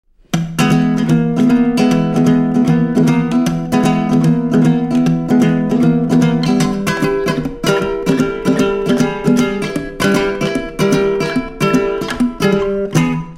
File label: ukulele blues; Niea Under Seven; niea7